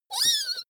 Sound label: child laugh high short